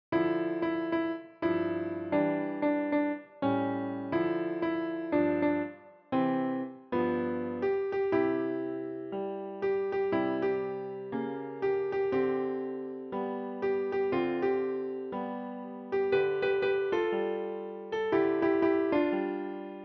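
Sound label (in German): Klavier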